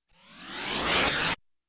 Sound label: Space Double Stab, Electronic; Sound Ideas 2000 Series